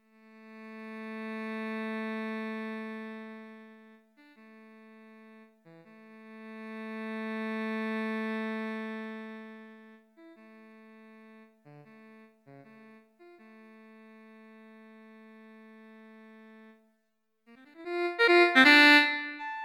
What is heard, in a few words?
MIDI